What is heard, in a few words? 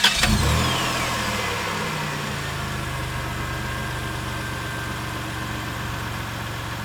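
Engine Startup, Transportation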